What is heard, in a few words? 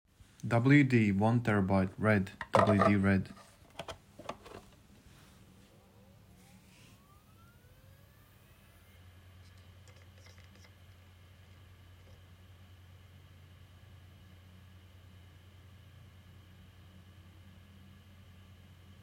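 wd red 3tb, hdd noise; wd red 1tb; What are the Quietest hard drives (HDD)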